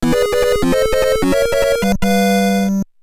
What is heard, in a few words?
Hurry Up Fanfare; SMB1 Sounds